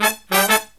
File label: Killer Brass Riffs, 108 soul